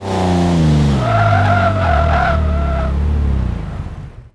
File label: throttle off, vehicles; gmod; tdmcars; syclone